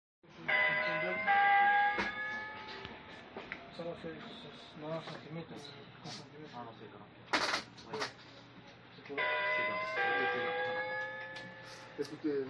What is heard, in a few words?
ding